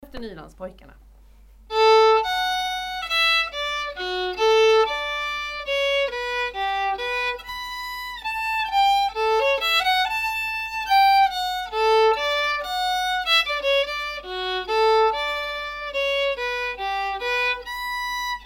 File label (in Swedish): Vals; Nylandspojkarna